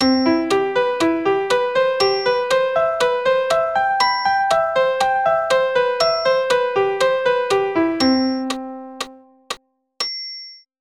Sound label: CM7 broken chord, keyboard